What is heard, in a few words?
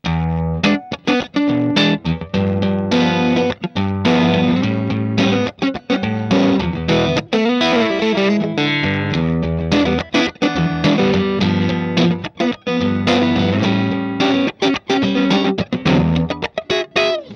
ecc81 6l6 blues; uraltonejr